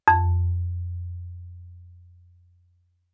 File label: Marimba rubber ff F2; MIS Pitches - 2014; Percussion